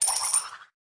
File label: Score Increase; End Run Screen Score Increase; Subway Surfers City; Android, iOS